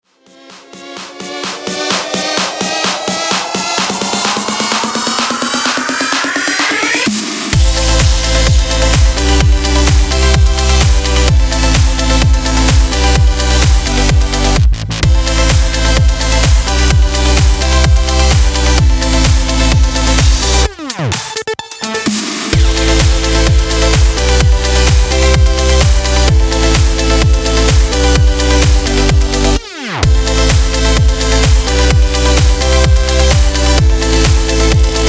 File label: Electro